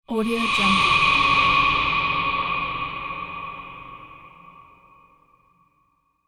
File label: hollow space swell; sound ideas